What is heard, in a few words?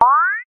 multimedia game bounce jump